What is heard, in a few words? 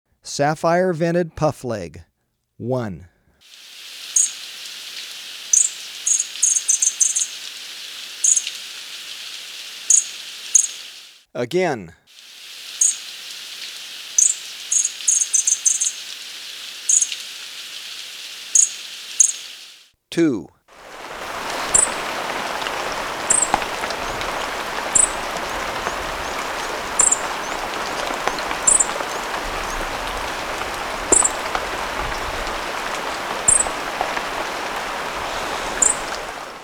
Sapphire-Vented Puffleg, Birds of Ecuador; S. America